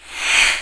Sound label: pain; Alien Arena; xenoid